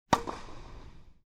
tennis

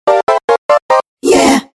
dialing; Рингтоны смартфона Micromax, мелодии будильника и сигналы сообщений

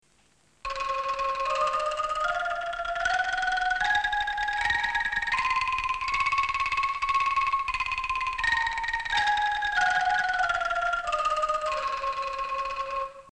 angklung

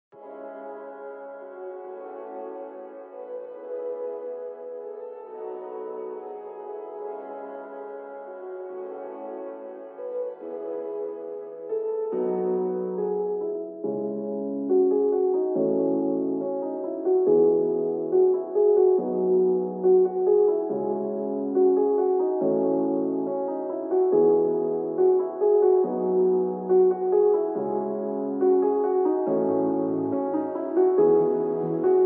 chillstep

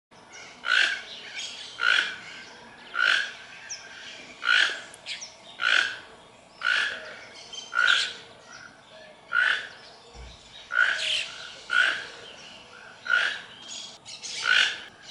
tucan, zvuk tukana

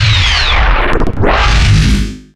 power wooshes

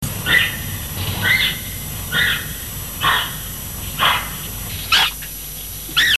dugong

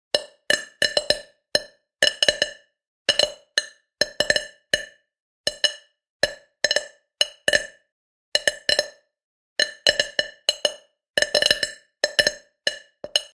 pop

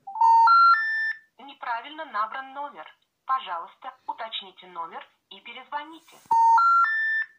telephonn robot

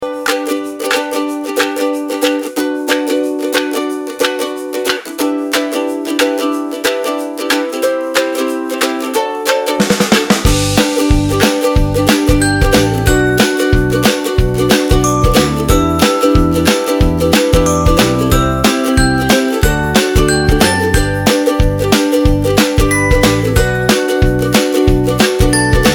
happy kids background music

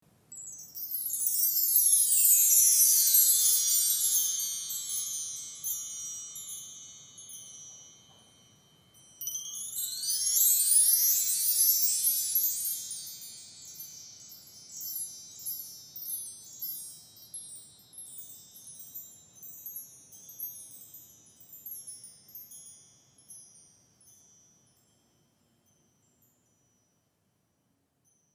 bar chimes

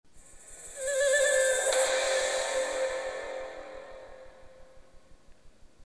asian morning

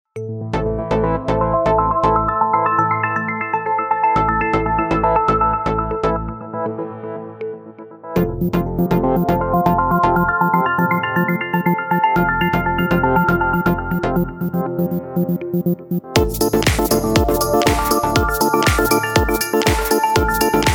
floating, zvuki telefona infinix

